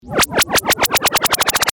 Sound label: Ascend Zaps; Sound Design